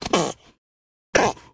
Lizard, Tokay Gecko Spit. Close Perspective.

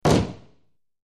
Gavel Impacts Dull

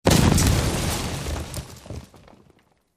Explosion Rock Debr, rock & sand

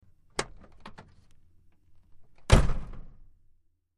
Wagoneer Rear Tailga, jeep wagoneer

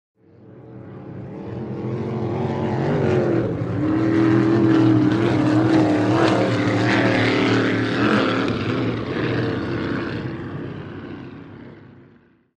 Power Boat By Fast, off shore power boat

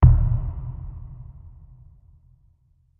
Bass Impact Low Drum; impacts

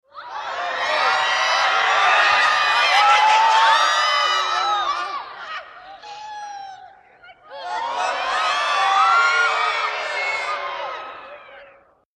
Theater Crowd Scream

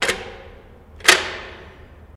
Jail Door Latch Open; jail & prison doors

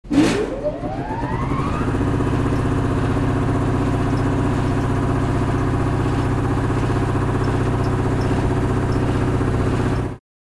Heavy Switch On Run, generator

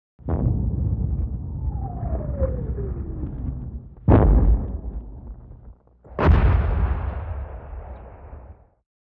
Shell Whir Dist Explo, modern artillery exterior

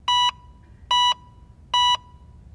Heart Monitor Beeps

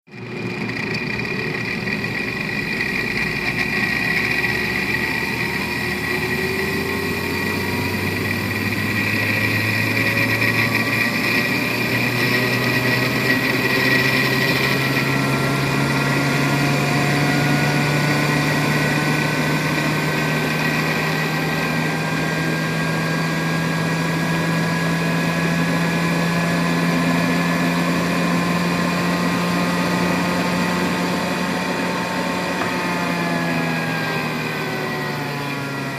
HOSPITAL EQUIPMENT HOSPITAL: Centrifuge on & off.

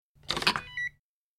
Swipe Key Card Alarm; hi-tech-various

